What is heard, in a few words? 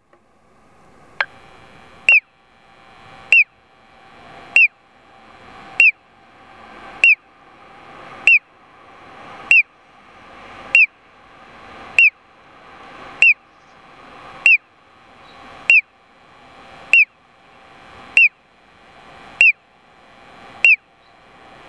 piyo, onkyo kosaten; koito MP-I-2; okayama minami namikicho1chome